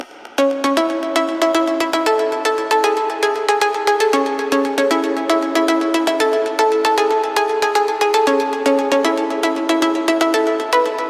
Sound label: Pluck